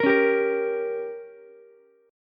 G Diminished, Ukulele; strummed; JGuitar

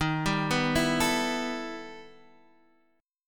E Minor Major 11th Eb

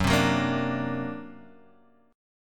D Augmented 7th F sharp, guitar

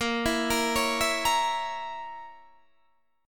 Bb Diminished Bb down Guitar Open D

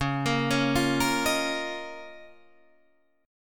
C sharp 6th Add 9th C sharp down Guitar Open D

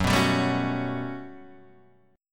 G Major Sharp 11th F sharp, chord

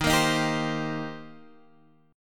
C Minor 7th D sharp

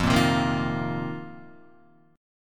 F 7th Suspended 4th F down Guitar; strummed; JGuitar

